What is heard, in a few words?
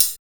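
hi-hat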